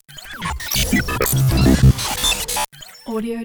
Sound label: servo calculations; soundmorph